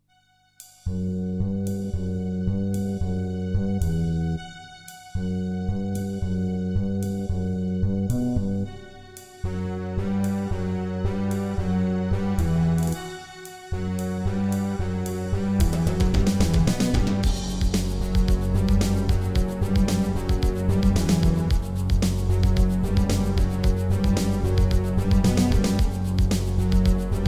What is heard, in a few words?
Terratec MiniWaveSystem WT64; Duke Nukem 3D